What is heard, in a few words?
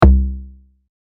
log drums